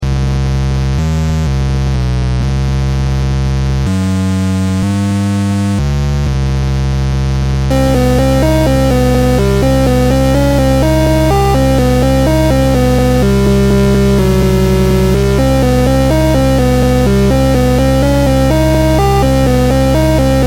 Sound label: Synthy